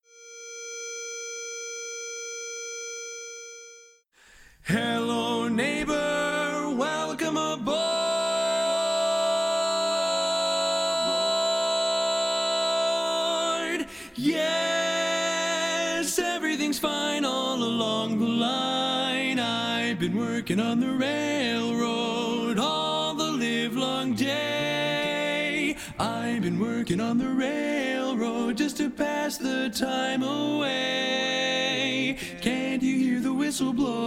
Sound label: I've Been Working On The Railroad, Lead; Polecat II